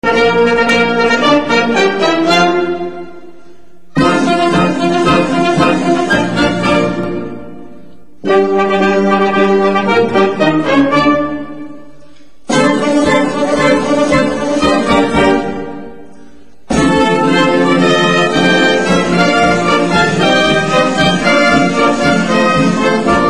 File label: vocal; italy